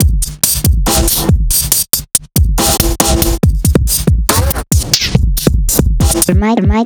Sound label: drum